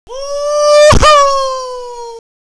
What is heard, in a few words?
woo